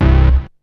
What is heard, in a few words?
BASS; SoundFactory; SMPMANIA